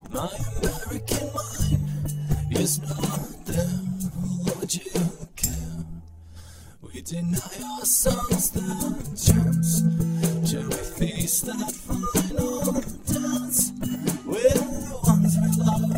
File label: percussive